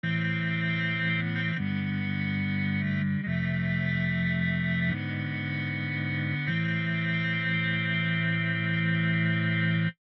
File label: Cello, tape sampler; electrickeys; Class Tron